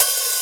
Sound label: original open hi hat sound c sharp key, Royality free open high-hat tuned to the C# note.